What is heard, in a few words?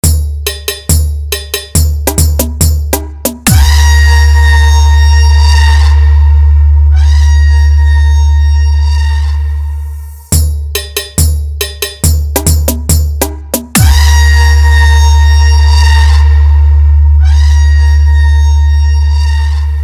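Competition Intro Dialogue Dj Remix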